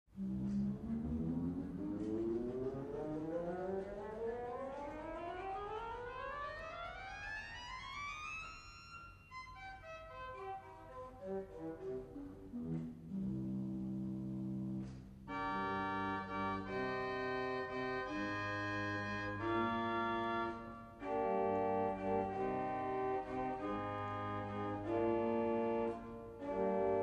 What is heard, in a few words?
Gamba 8; Schultheis Schlimbach